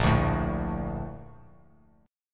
Bb Major 7th, Bb, down; BbM7 strummed; JGuitar

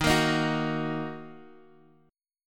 Eb Minor, Guitar Standard; Ebm strummed; x,x,1,3,4,2; JGuitar

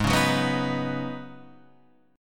C sharp 7th Suspended 2nd Suspended 4th G sharp

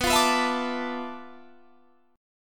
D Minor 6th Add 9th B

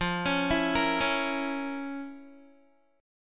F Major 7th Suspended 4th Sharp 5th, Guitar; FM7sus4#5; 4E,4A,5D,5G,5C,6F; arpeggiated

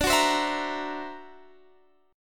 D Augmented 9th down, guitar

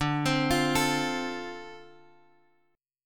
D-Minor 7th D Guitar Drop D, chord

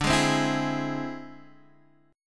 E Augmented 7th D

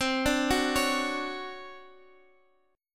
D Major 7th, chord; Dmaj7/C; arpeggiated; JGuitar

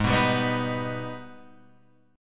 Ab Minor Major 7th, down, Guitar, Open F; AbmM7; strummed

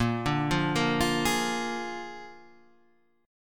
A sharp 7th A sharp

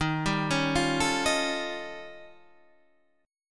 Eb 7th Sharp 11th Eb; arpeggiated

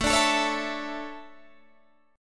D Major 7th Suspended 2nd

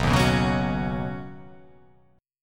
B Major Add 9th B, chord; Badd9; strummed; JGuitar

